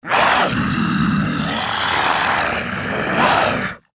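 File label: alert, zombie male; nmr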